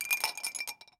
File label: meditation